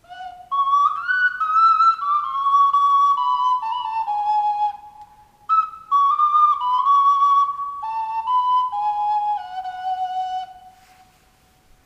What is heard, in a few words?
olympus Garage, ocarina